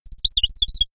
bird chirp; Pingu-&-Friends

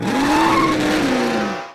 car start tyres; lightning speed

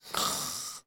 worm fall asleep; sweetcandymania

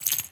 chips; pharaohslotscasino

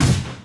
enemies normal hit; spider-man-mysterio-rush-game

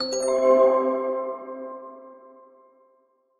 level up; pharaohslotscasino

